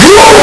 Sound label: pain